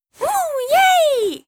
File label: pink Win Elbow; netent